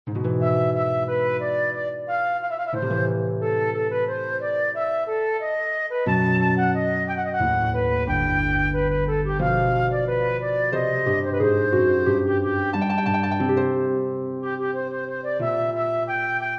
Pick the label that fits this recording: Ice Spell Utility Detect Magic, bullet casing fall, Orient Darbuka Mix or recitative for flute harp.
recitative for flute harp